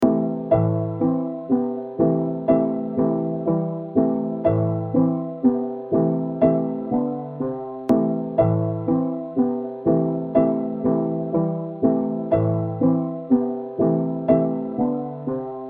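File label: A minor 122 BPM Piano Keys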